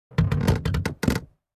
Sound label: Squeaky bed wood movement